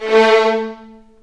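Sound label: A3 violins; The Ultimate String Collection; East West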